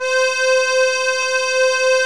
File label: ACCORD YN; Roland - LA Composer vol. 1